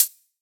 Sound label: HiHat, Psytrance; Drum Shots